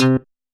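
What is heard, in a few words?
slap bass; Yamaha SY85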